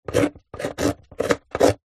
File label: office pen biro draw shape on thin wood